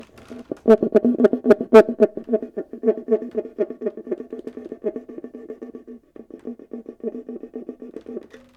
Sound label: cuica